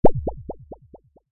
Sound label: Game spring bounce or jump